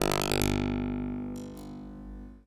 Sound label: plucking